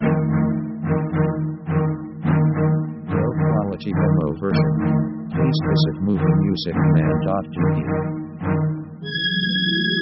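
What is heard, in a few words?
orchestral progress american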